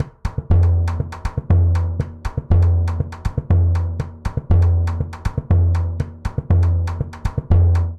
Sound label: Samba 1 Surdo 120bpm; Latin Percussion; Ableton; Factory Packs